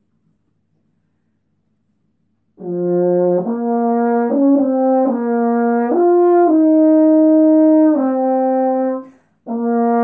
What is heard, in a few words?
French horn; ساز هورن